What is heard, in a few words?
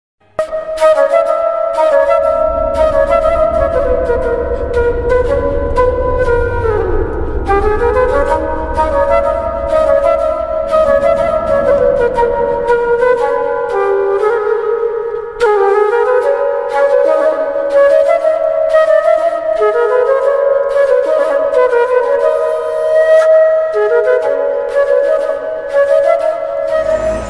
flute bgm lofi remix ringtone flute ringtone; Ghost war